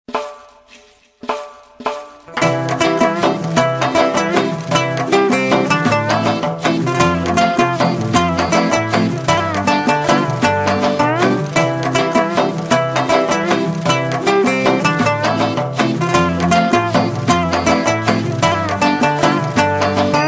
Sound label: Jingle bells (Uzbek version)